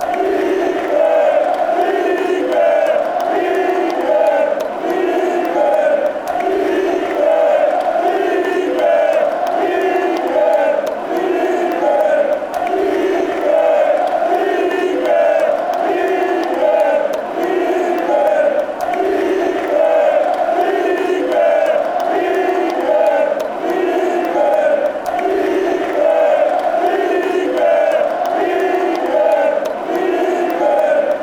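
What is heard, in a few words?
athletic chant, football songs and soccer chants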